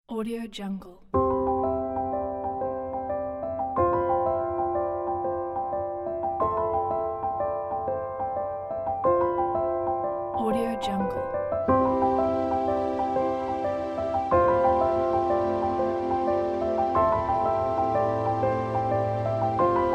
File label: gentle story with piano